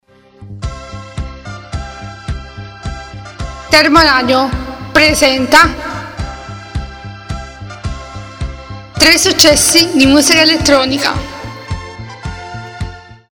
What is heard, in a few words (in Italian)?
elettronica, jingle; successi